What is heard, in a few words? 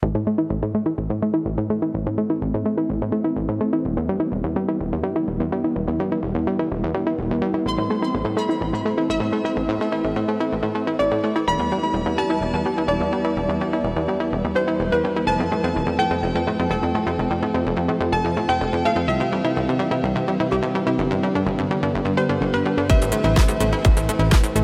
Electronic Impulse